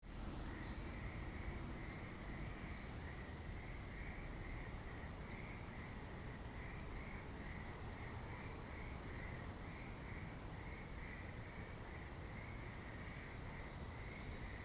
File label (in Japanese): ezomusikui